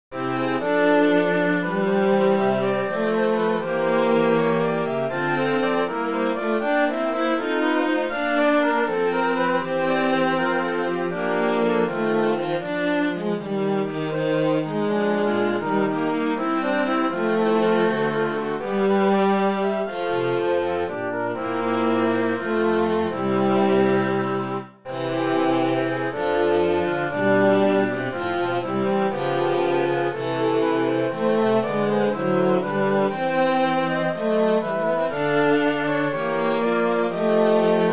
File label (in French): quatuor; des planetes